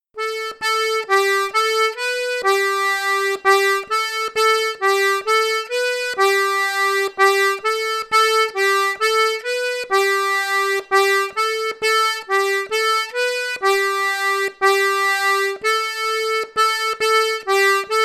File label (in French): ronde de loudeac lent